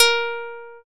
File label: guitar